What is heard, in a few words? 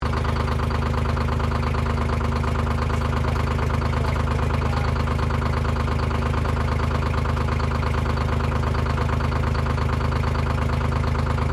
Tractor Unknown Idle, Old tractor; Tractors; Vehicles